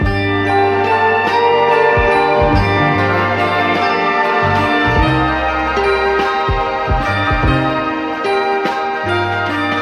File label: keys tragic, sad loops